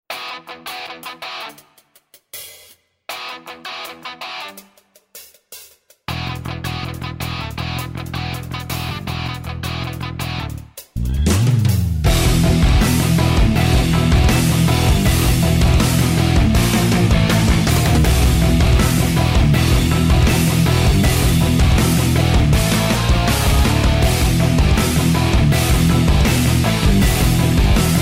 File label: music arena boss; iDaggers; Android